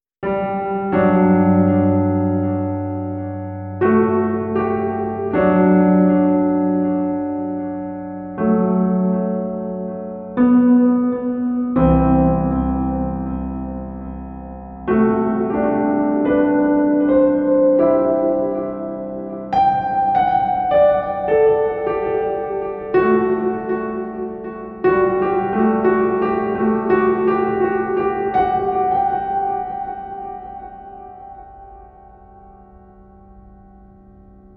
metaphysics melody, music ambience; The Swapper

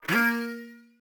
bot success

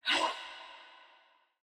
fire spirit ha short; boss

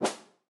sweep short hi; CrossCode; battle

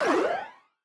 circuit upgrade

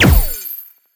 ball throw; CrossCode; battle; key

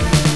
pinball; Epic Pinball; DOS

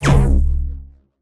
throw ball wave charged; CrossCode; battle

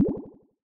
blubb, battle; CrossCode

